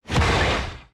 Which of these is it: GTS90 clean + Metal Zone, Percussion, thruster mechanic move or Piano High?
thruster mechanic move